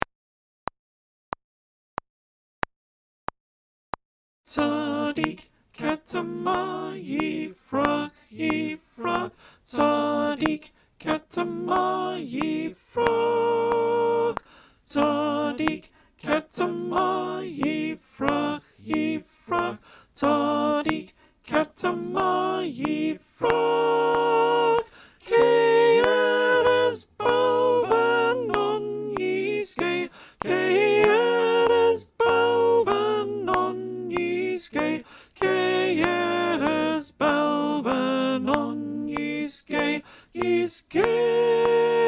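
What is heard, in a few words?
Soprano clicktrack, choir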